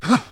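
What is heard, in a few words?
man exercise; Mount&Blade Warband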